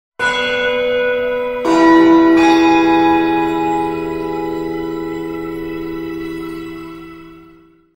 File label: bell tower ringtone